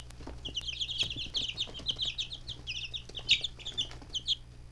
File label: cheep, chicks